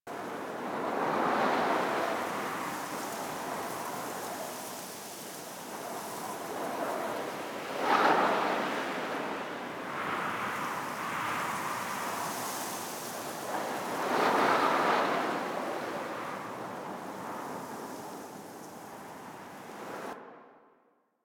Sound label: night wind grass medium loop; Ambience; Props; Loops; Warframe; Eidolon Plains